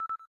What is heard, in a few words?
cam timer last